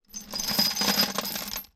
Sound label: Metal Bolts; 99Sounds; The Warehouse